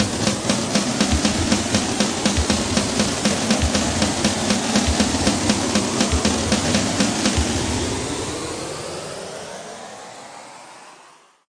ascending noise drums; Various Strange Sounds and Effects

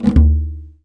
arabic perc, Arabic Percussion Kit

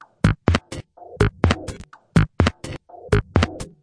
125 bpm minimal broken beat

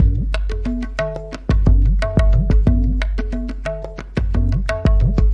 90 bpm, tabla and deep kicks

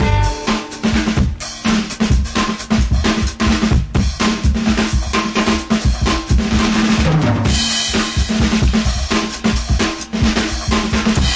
DRUM LOOPS and BREAKS, 129 bpm; CutYerOwnDamFunk

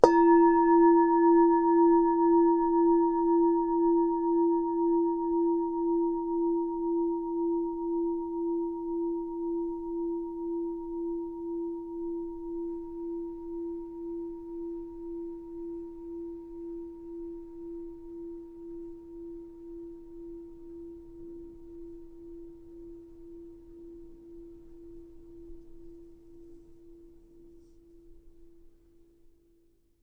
one tibetan bowl strike; Bells

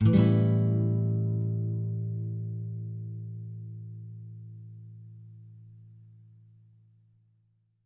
Nylon Chord A aug6; Nylon Guitar Chords

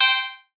Yamaha TX-81Z PC; drum machines

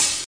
hat; Hats

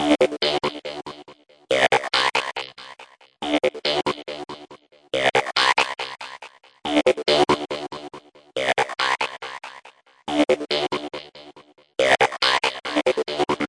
electrotalker echo, synth and electronic loops

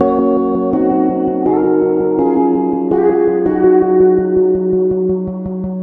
memory repeats guitar; REMIXABLE COLLECTIONS

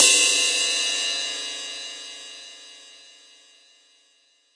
rs7000 hh cy gb5, Yamaha RS7000 Cymbals; drum machines

